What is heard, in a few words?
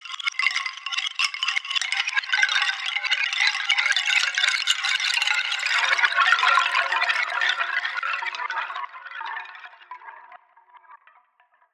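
bamboo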